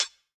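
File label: closed hi-hat; eddyflux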